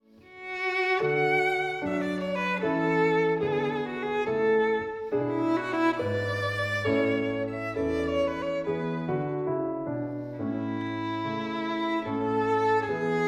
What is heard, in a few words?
VIOLIN; Trinity College London violin exams for 2016-2019; Leduc: Grazioso (2nd movt) from Sonata in A op. 4 no. 5